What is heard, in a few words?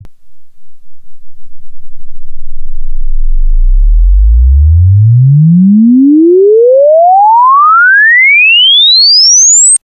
1hz 10khz sweep